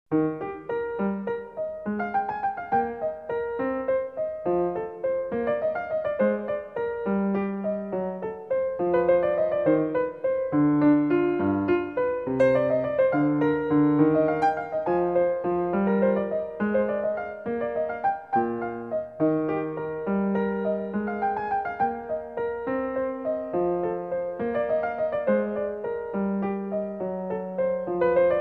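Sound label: invention E flat, classical keyboard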